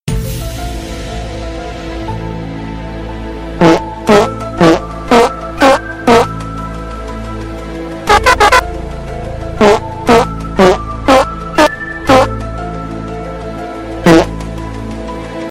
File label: doot fart trumpet